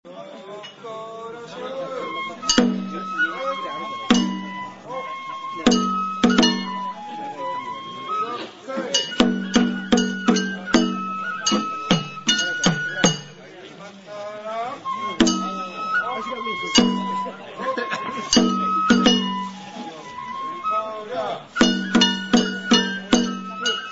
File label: banjo